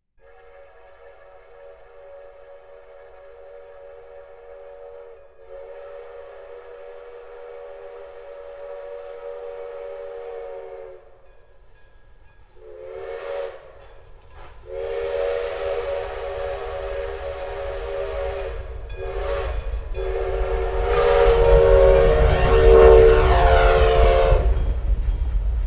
Authentic Steam Engine

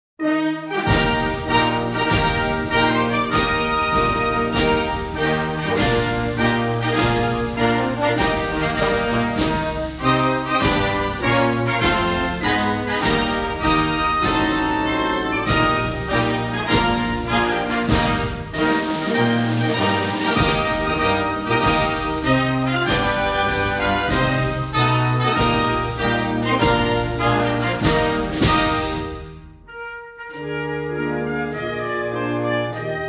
Mexico - Himno Nacional, Orchestra; The National Anthems

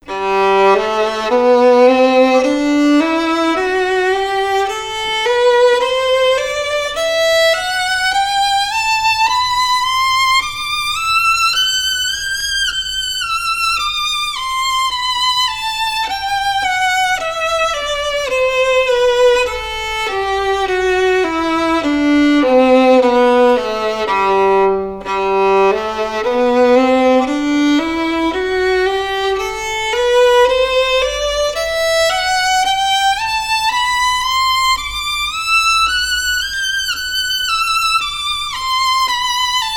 200-20kHz Timbre Balance Test; TIS Vivid Audio 2022 Showcase; 千萬名琴調音色

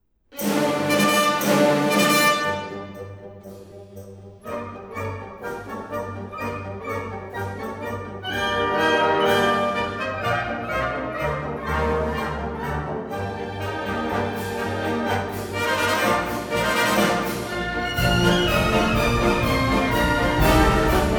Persischer Marsch, Symphony Orchestra; Strauss Jr. (J); Op. 289

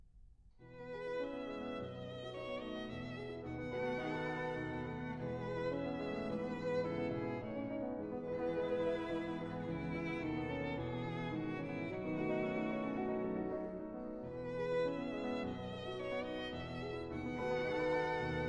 Piano Trio

